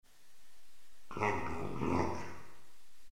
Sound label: cling clong